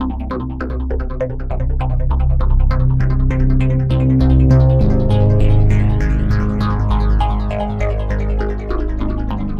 NRG Arp, trance

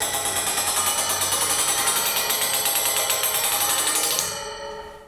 Railings; neuro; InsideInfo; Real World Sounds

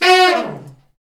BRS, Fat Falls; Roland L-CD702; Fat Section

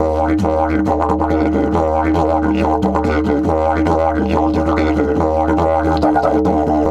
140, DIDGE RIFFS

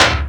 PERC FX

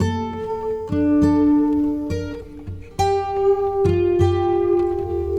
Guitar And Synth

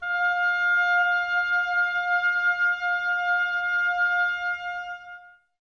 OBOES F, Oboes, Wood Winds; Miroslav Vitous; Woodwind Brass Ensemble

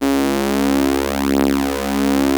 OB C#3, Rare Synthesizer; Hollywood Edge - Giorgio Moroder Rare Synthesizer Collection; OB 4 VOX.

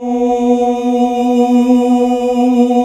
male voice B2; AKAI S-Series CD-ROM Sound Library VOL-2

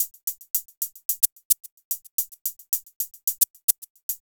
Cymbal; Sonic Foundry (Sony Creative Software); Trance NRG VOL-2; Drums; Cymbals

